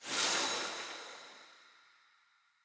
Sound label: Talisman Paragon Burst; Cosmetics; Talismans; Founders Talisman